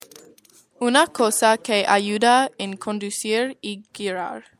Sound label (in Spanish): bocina